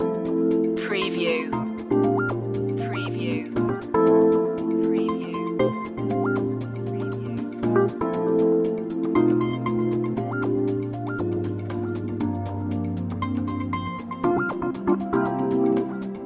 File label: flute and fruit loop